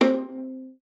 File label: C4, VIOLA FINGER; Xsample VOL-5